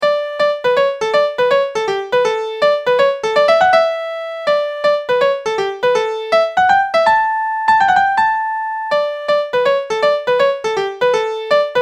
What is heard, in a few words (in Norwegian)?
Udelt takt, Springar